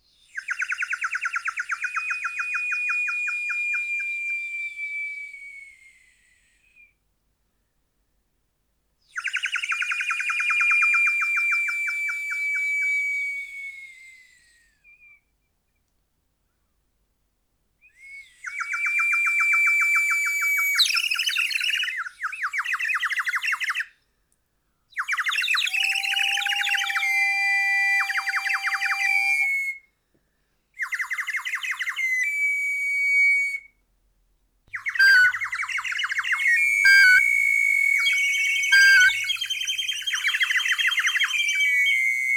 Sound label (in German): floeten biophonie